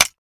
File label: Mouse Trap Classic, Mouse Trap Hard Solid Snap, With Very Quick